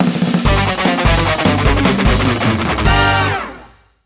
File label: Touchdown; NFL Rush Zone; Leapster Explorer